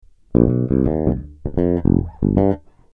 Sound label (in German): grunz